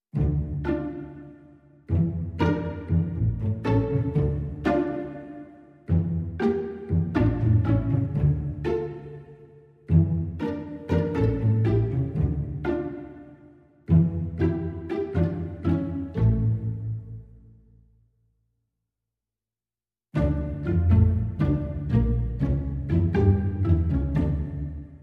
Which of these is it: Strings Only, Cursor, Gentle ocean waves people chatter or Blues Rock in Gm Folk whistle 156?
Strings Only